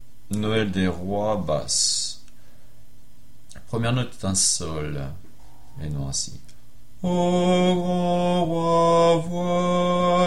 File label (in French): basse; Noel rois